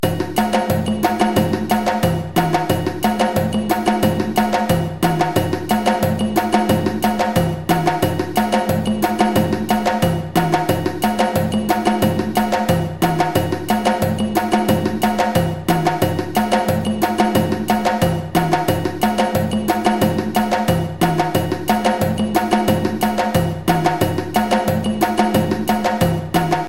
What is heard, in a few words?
Tassaba Groove 180 bpm, djembe